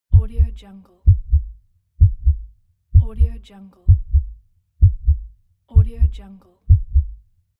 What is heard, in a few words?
heartbeat sound